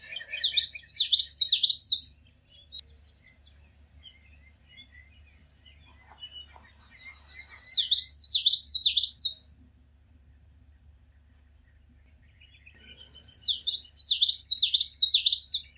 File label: Common Yellowthroat